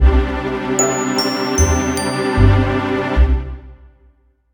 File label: jingle